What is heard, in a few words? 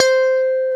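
funky strat guitar; 90's Sample CDs; Club-50 - Foundations Roland; Funk & Mute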